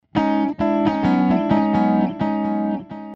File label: Digital Delay